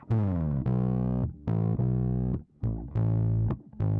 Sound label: bass; musdb18